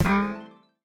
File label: Heart Arrow; Smashy Road Arena android ios 2016